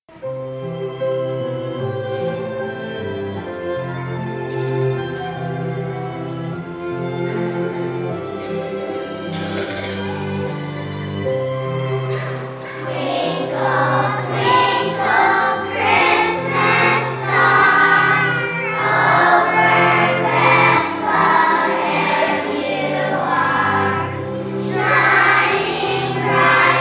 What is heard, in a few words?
childrens choir